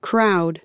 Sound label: crowd; kraʊd